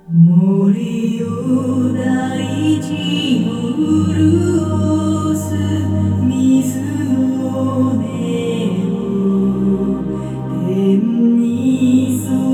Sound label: music; ambeo